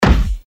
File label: hollow impact